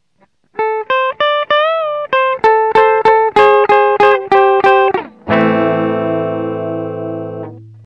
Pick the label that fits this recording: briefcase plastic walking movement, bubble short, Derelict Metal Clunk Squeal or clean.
clean